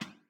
breakbeat

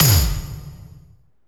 SE GAME BREAK; maimai

